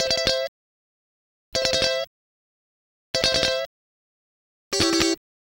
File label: Guitar Licks